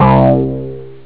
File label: Bass; SynthSamples2